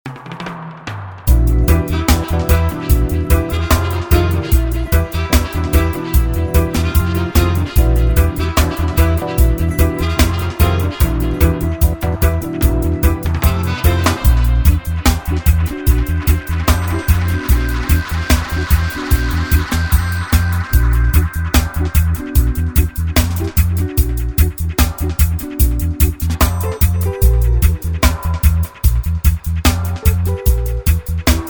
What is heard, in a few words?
Instrumental Dub